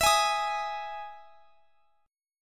E Major 7th E down

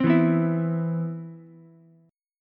C Major 7th, C, down, Ukulele; CM7 strummed; JGuitar

